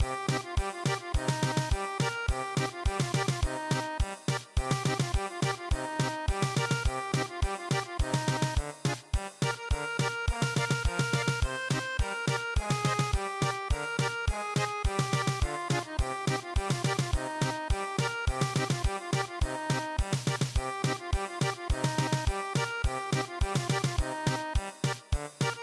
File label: German Polka